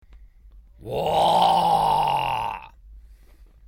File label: waaaahhhhh